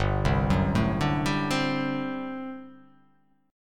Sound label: G Major 7th Suspended 2nd Suspended 4th G down